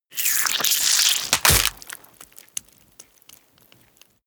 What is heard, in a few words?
gore rip body apart